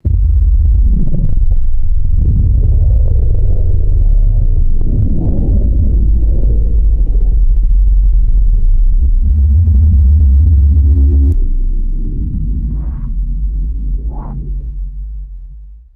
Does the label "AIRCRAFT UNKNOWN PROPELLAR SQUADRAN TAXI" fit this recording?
No